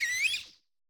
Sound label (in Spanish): Grito de Wattrel